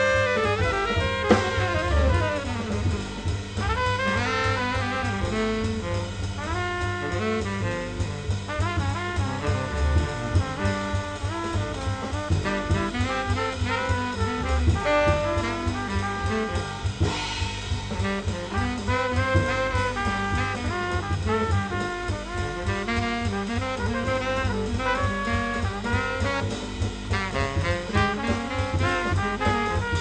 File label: jazz